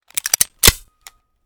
pp2000 unjam, weapons; Blindside's Weapon Reanimation and Rebalance - Military

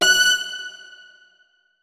notes, keyboard; Hideout Furniture

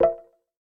pda guide; New 3D PDA Interface

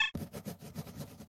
pda guide; Enhanced Graphical User Interface

